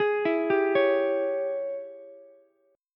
G sharp Major 7th Suspended 4th Sharp 5th, Ukulele; 13,16,15,16; JGuitar